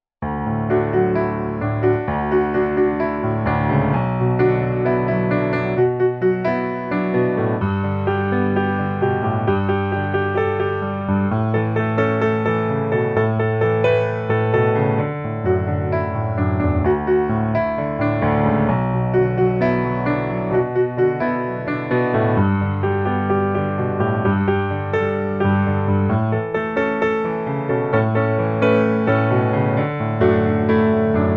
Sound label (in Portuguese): Celebrai piano